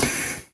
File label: hydrolics; mm ninja